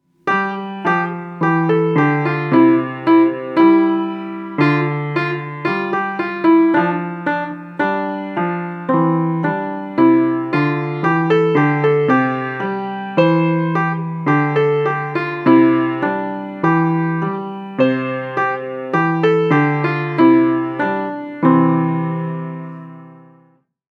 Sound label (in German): Lasst uns froh und munter sein Klavierversion